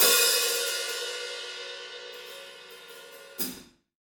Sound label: crash cymbal sound B key, Royality free crash cymbal single hit tuned to the B note.; cymbal drum samples